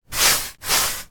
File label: industrial paintbrush 2 strokes on door